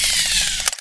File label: minigun spin down